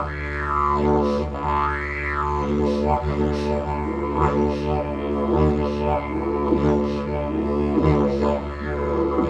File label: Digiridoo